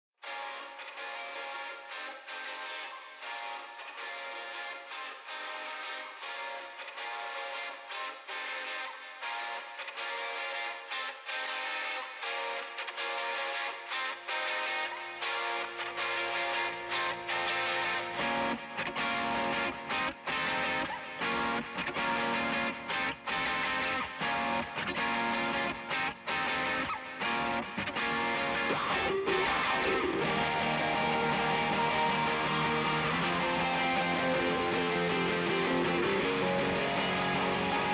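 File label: guitars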